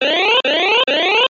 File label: Motor; Galactic